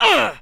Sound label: Male Grunt